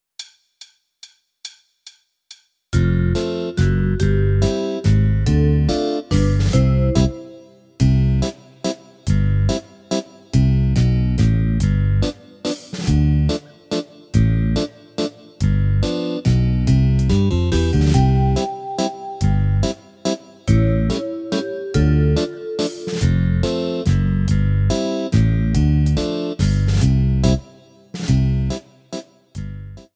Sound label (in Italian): base, mazurka